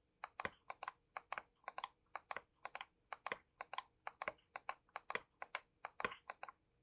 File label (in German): Seitentasten